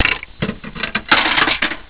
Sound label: coin